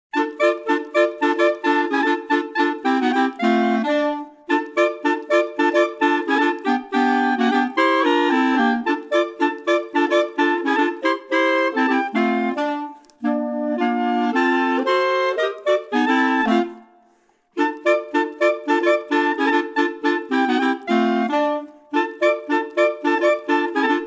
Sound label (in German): Klarinette